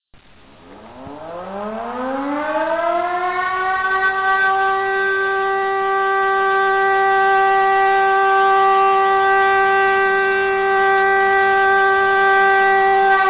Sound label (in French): sirene